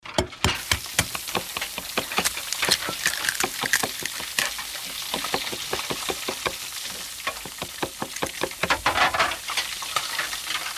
Busy kitchen ( no voices ), sizzling, chopping, dishes etc.